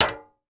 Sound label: impact metal tin lid on tin; sound effects one